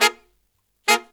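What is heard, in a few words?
Pop & Funk Brass, 110 Bb, right; Best Service ProSamples vol.25; AKAI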